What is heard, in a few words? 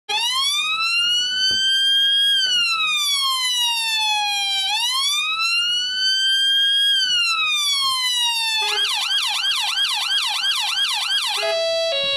police sirens